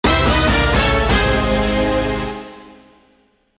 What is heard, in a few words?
JINGLE